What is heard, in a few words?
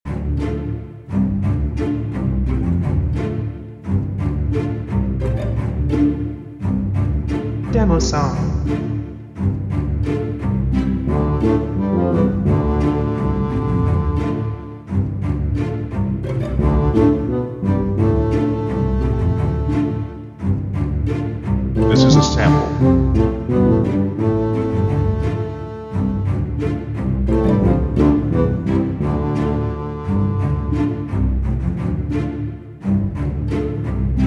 Loop Variant